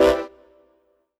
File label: CHORDS; EastWest; Dance Mega Hip Hop Electro